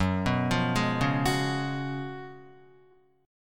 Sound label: Gb Major 7th Suspended 2nd Suspended 4th, Guitar; GbM7sus2sus4 arpeggiated; JGuitar